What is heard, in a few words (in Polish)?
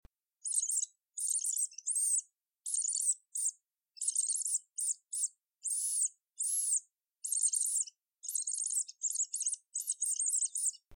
wabienie myszka